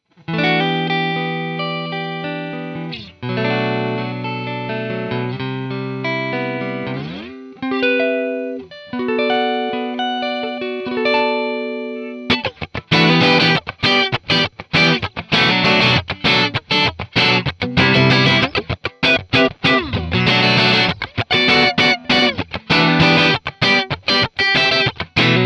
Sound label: guitar Funk; Solar